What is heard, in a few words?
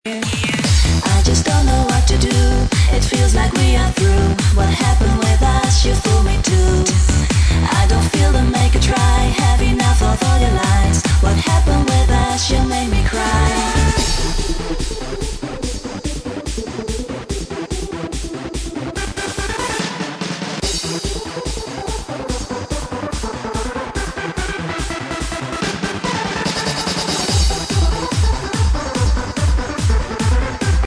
club mix